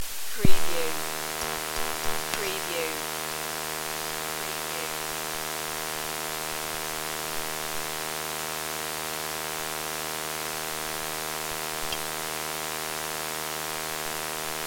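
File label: Handheld CB Static Snow; Cobra Hanheld CB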